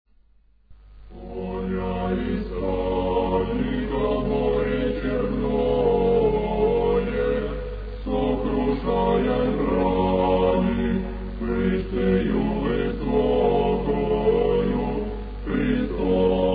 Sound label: irmos; octoechos